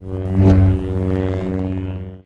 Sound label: lightsaber on and hanging out